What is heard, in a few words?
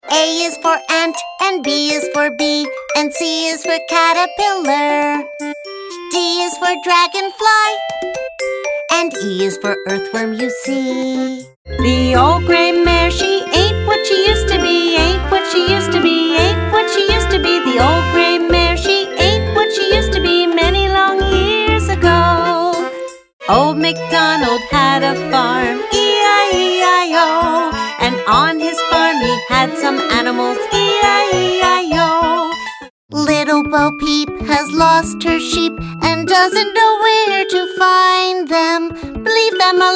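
American, Songs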